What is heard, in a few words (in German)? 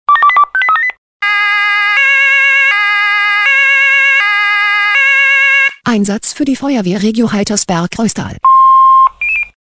SMS Alarmierungstone; FW Heitersberg